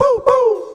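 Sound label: vocals, Roots Disco; USB Soundscan vol.32; AKAI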